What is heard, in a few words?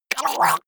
monster gurgling high; kreaturen